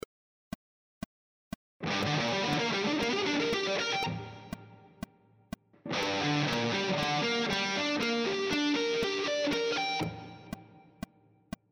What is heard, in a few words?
triad arpeggio sequences, guitar